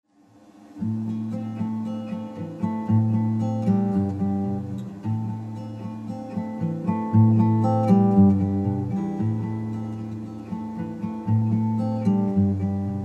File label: guitar raw; videogame; Zelda WW